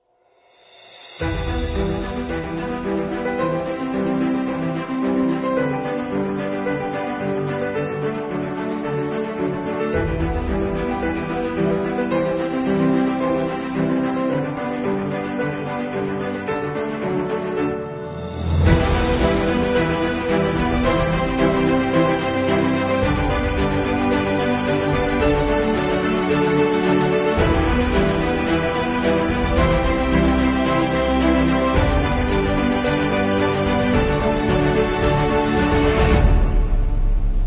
Emotional Inspiring Cinematic Epic Trailer Without Final Chord